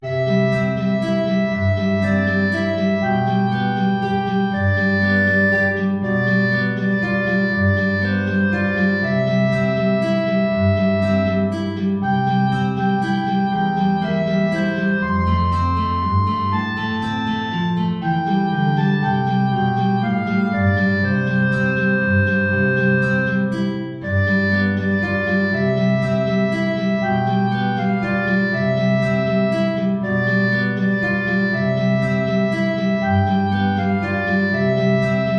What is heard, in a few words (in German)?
Klarinette in Bb & Gitarre, hoch; Süßer die Glocken nie klingen